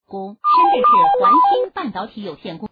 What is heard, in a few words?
Classic Ring Tone